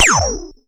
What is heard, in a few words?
normal hit whistle